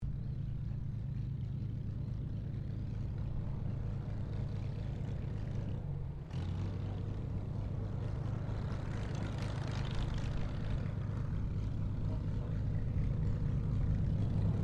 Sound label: Aircraft CT4A Taxi Shutdown, RAAF CT4 training aircraft; Airplanes (Sound Effects:Vehicles)